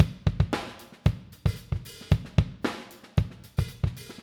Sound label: oh plus kick